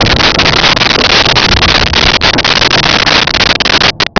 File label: glacier rumble